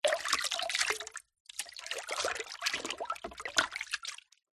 household water splashes movements in plastic mop bucket; zapsplat